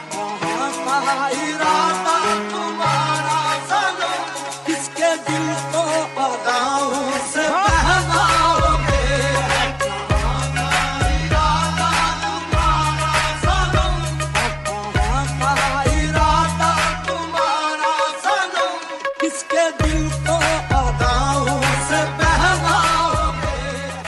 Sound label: sad ringtone; english ringtones